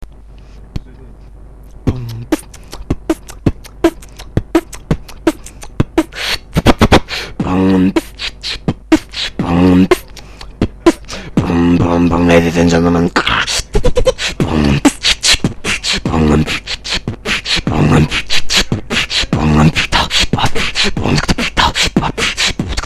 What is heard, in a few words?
beatbox